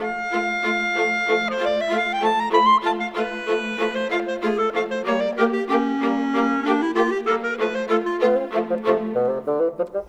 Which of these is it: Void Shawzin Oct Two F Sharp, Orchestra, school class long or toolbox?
Orchestra